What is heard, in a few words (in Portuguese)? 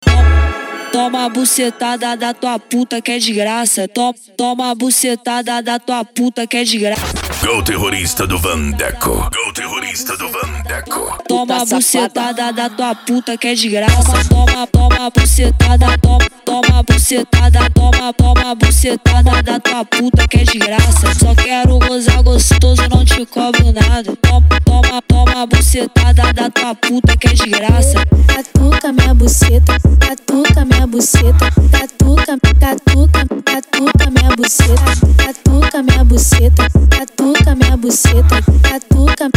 dance e funk mega bass